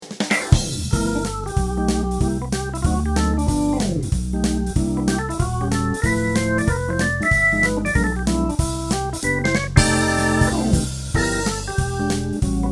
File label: harmonic bars